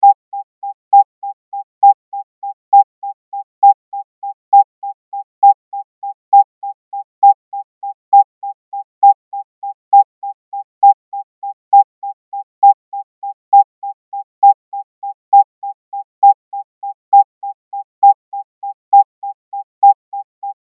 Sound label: 800Hz 3 beat 67 metronome; music; rhythm